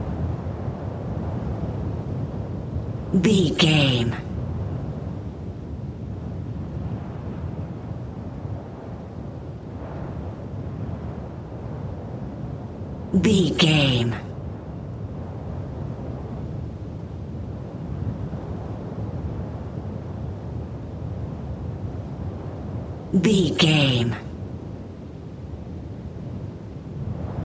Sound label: wind general soft low loop